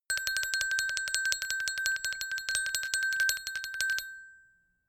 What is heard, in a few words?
glockenspiel tremolo high; percussion